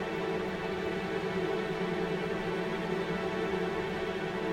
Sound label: Suspence